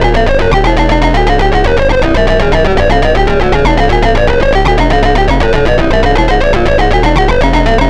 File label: S&H Loop FM Dirge A; VortexualAmplitude